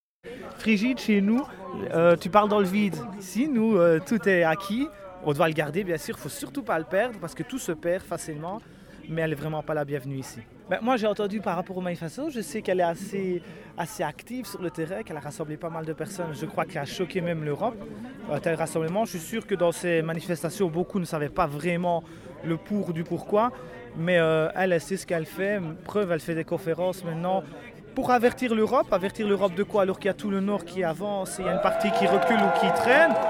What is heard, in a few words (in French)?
ambiance, rassemblement; rassemblement contre Frigide Barjot